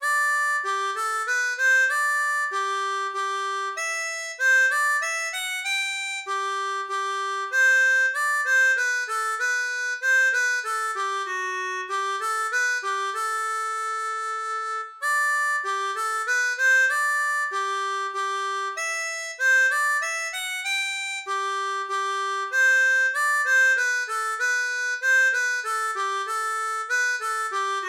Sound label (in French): Menuet, harmonica; Bach114